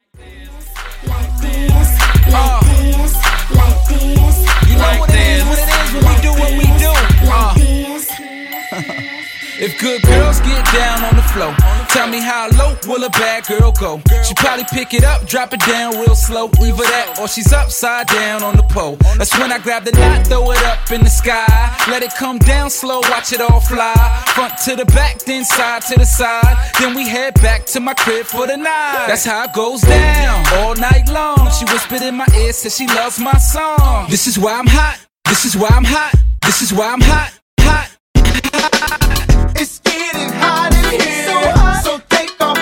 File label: Funk Wordplay; 97 106Bpm